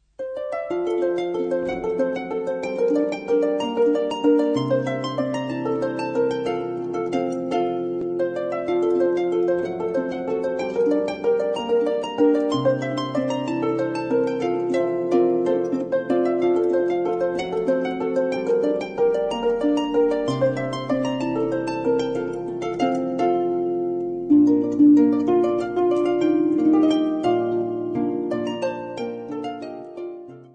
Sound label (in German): Tiroler Harfenklänge